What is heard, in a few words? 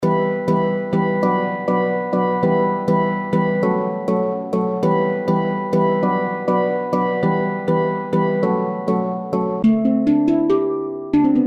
Handpan Melodies; Clark Samples